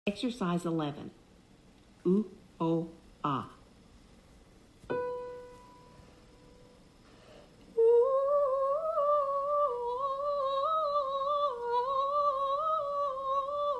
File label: Vocal Warmup